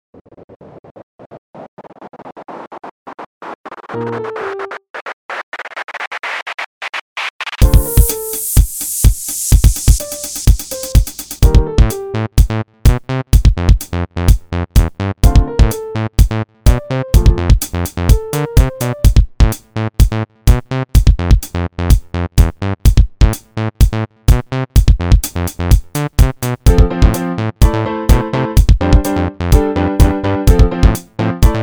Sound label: Dance Pop Jingle Electro Pop Uplifting Trance Mix